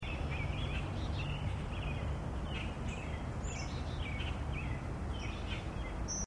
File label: marsh sound